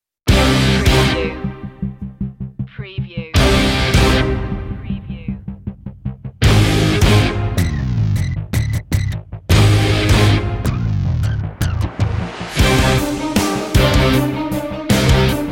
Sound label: drama heavy